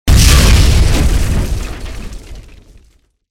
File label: explosion; ttt; plebville-ttt; terrortown